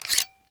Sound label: Reloading begin; 完善武器音效; DungeonShooting; Godot